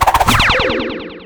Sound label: Character Attack